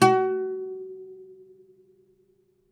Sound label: OUD F#3, Plucked Strings; E-MU Producer Series Vol. 7 – Old World Instruments